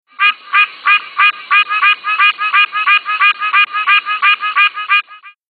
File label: Pine Barrens Treefrog; herps of NC; anurans